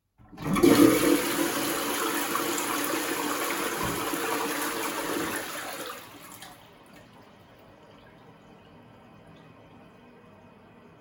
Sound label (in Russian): sliv unitaza i bachka